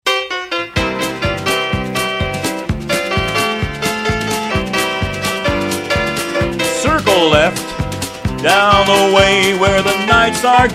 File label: vocal